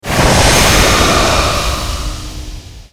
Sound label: eraser; gwyn